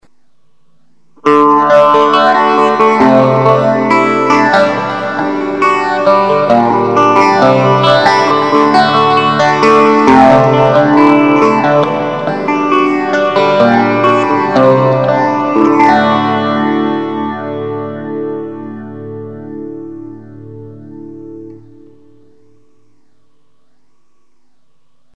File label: Phase Bridge, guitar pickups; Platinum